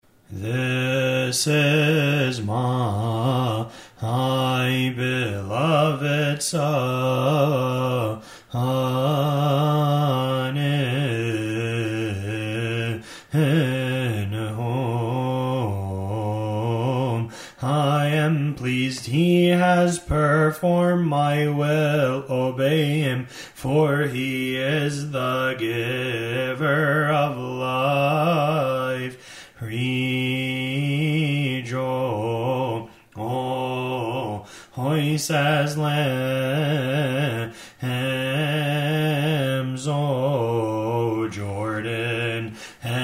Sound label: verses of cymbals